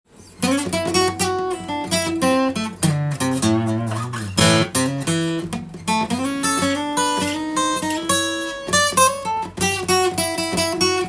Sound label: yamaha ll36, guitar